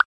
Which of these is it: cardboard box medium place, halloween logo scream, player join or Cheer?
player join